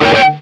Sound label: dialog question; jam; stereo